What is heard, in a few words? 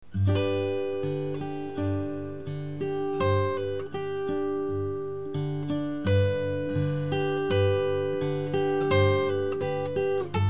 gtr mix; iPod7; MicroMemo